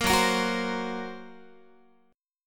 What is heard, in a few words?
Gb Major Flat 5th, down, Guitar